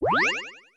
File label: spotted by goomba